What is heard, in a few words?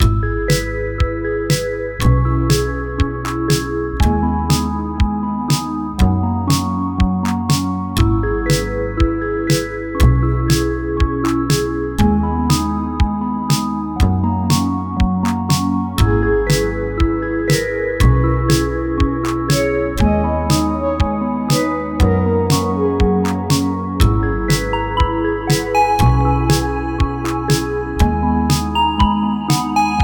chill wave